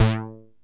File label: TEISCO, BASSES